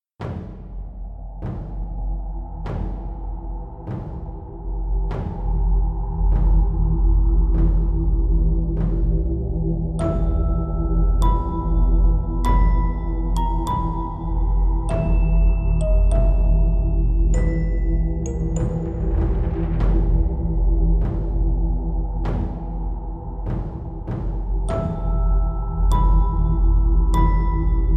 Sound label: Creepy Cave Music; Crystal Caves